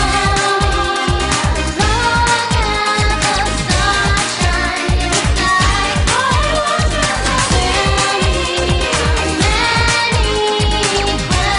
Latin Freestyle

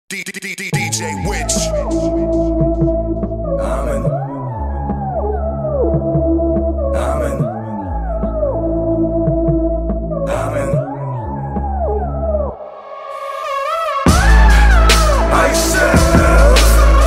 Bass Hip Hop; Amen

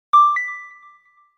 Email notification

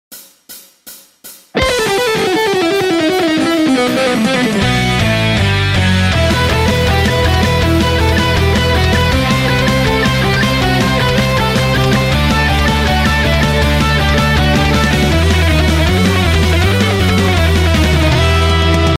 Power Metal Ringtone

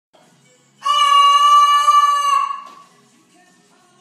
Cockatoo

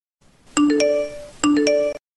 Iphone message

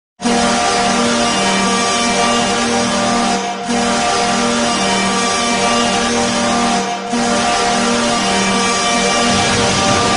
Hockey Goal Horn

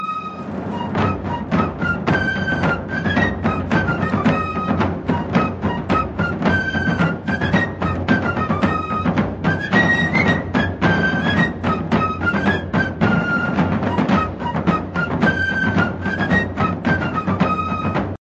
military march

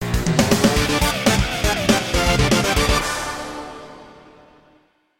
victory jingle; sans

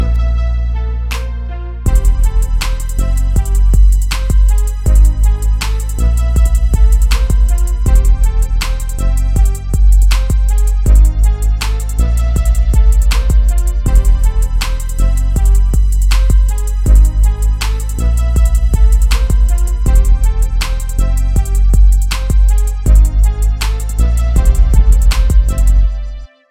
Hip Hop Beat

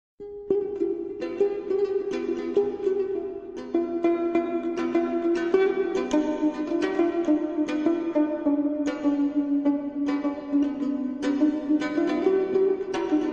Komuz remix

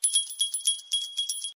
bells

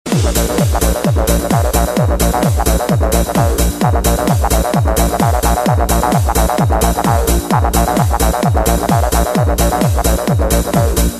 Bass Worker

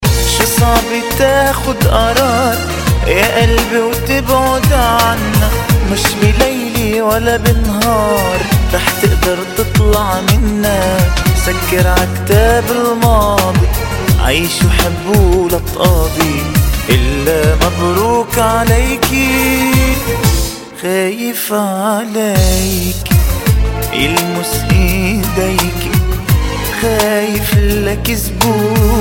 Arab Sad

